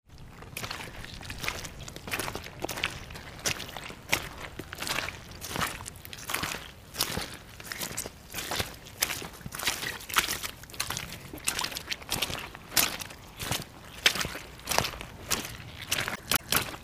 wellington boots squelching in mud

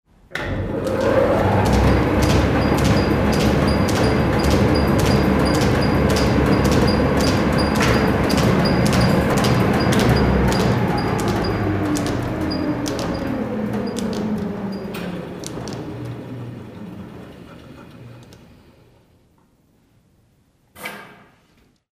rhythmn of a seed cleaner

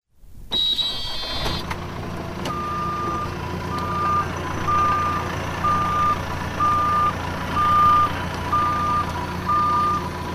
forklift starts up reverses and then stops

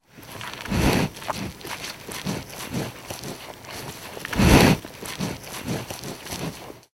the sniff of a one tonne bull

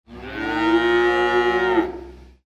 teenage cows moo

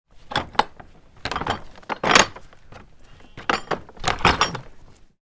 opening two bolts on stable door

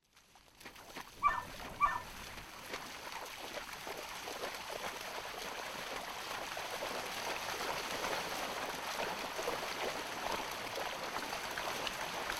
sheep cross shallow river with farmer and dogs

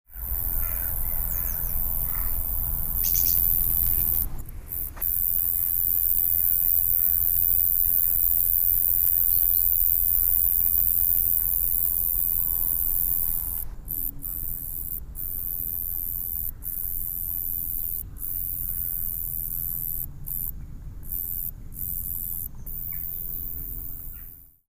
buzzy call of a tiny grasshopper on a sunny day

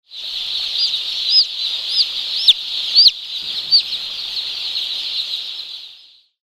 single chick cheeps out from the crowd

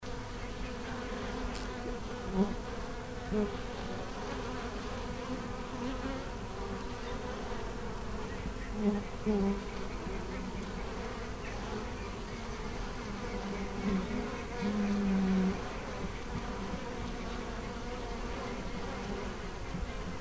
busy wild bees outside their nest in a tree

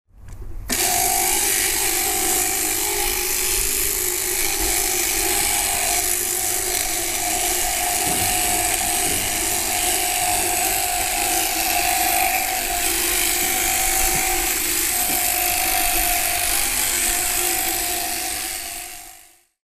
electric powered sheep shears

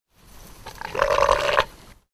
grandpa sheep the old ram calls

